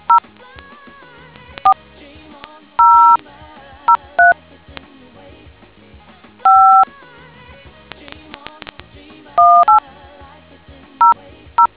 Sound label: dtmf